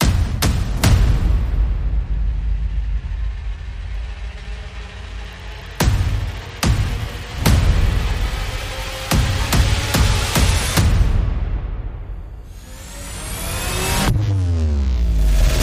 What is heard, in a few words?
Percussion and Effects